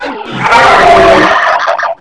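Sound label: death; quake2; rampage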